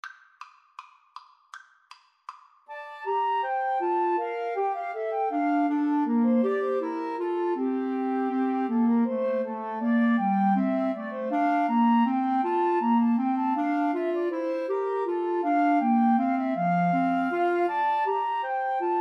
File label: bourree, 2 Flutes Clarinet